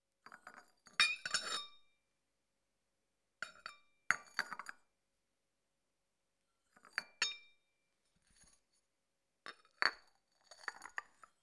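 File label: Cups, mugs clattering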